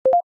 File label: blip